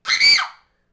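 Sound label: Settler Child Die Vox; Civ4 warlords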